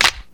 old school rich top end steel snare drum sample g sharp key; Duration 0:01; Samplerate 44100 Hz; Channels Mono